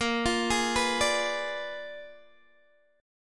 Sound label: A sharp 7th Flat 9th A sharp down Guitar Open D